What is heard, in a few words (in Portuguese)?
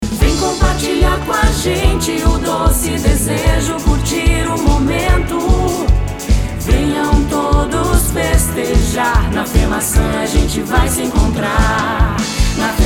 jingle